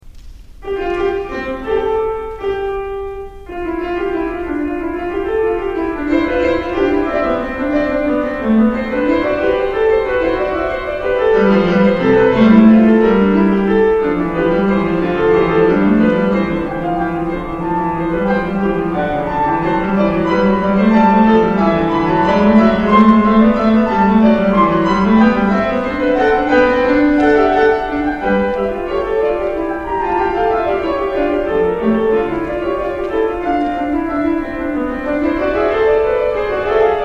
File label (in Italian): Fuga